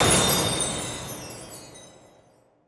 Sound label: Roll Hit; Snd Se Playable Roll F Sora Hit